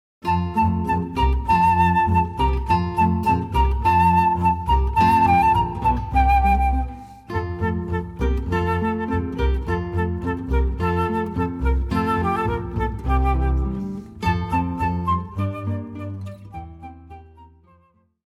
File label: Four Seasons, Autumn, guitar flute bass trio